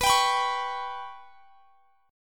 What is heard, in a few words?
B Suspended 2nd Sharp 5th; Bsus2#5; strummed; x,x,21,24,24,21